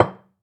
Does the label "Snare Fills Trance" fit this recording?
No